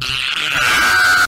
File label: screm